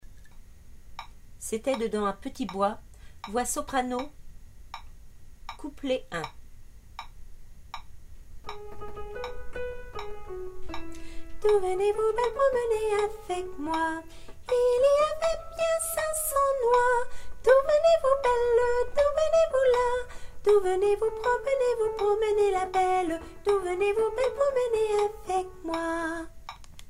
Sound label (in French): soprano